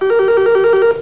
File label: phone